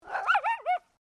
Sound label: Animal Dog Poodle Miniature Bark; Various domestic dogs; Dogs (Sound Effects:Animals)